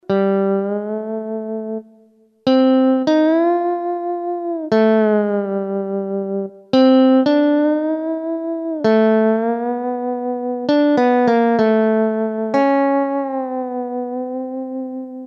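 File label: Hawaiian Guitar, Roland SC-50 Sound Canvas; Factory sounds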